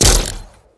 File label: geometric gun